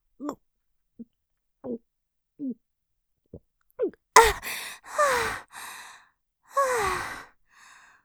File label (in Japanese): 射精余韻◆ごっくん！ぷはっ！◆音のみ; ループで楽しむ！シェフの気まぐれ抜き抜きコース